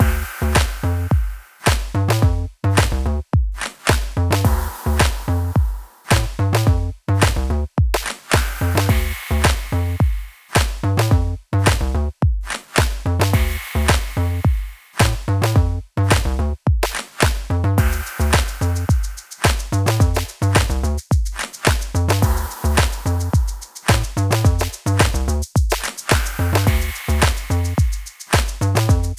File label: rhythmic, drums